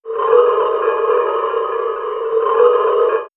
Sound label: Swirls, pads; X-Static Goldmine